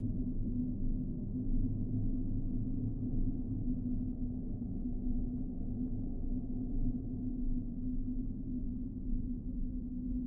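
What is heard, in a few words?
ambience, Caves Of The Abyss, Pile Of Bones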